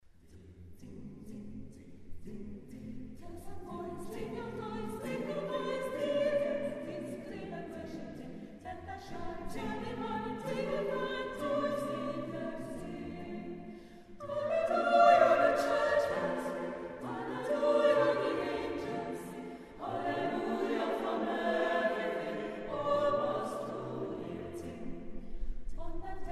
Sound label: Zither Carol